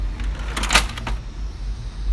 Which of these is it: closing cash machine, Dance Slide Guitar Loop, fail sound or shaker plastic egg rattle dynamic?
closing cash machine